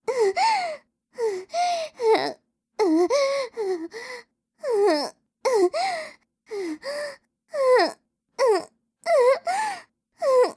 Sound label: moan Intense; oppaigames